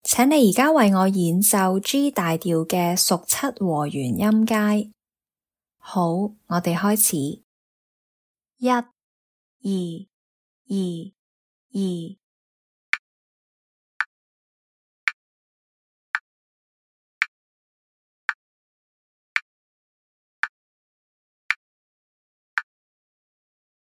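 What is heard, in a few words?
dominant 7th in the key of G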